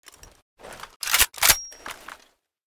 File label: unjam, weapons; ACE! 52